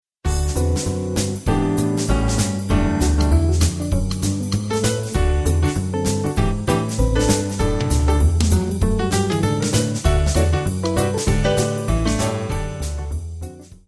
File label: JAZZ